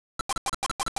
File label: scuttle; GBA; MLSS